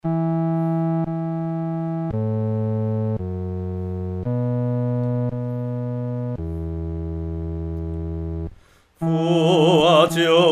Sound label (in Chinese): 独唱 第四声; 赞父奇爱